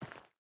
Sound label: footstep hide dirt walk; Rust